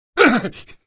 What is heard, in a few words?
monk pain; Source Engine; video game; ravenholm